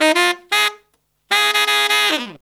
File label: 160 BPM, Horns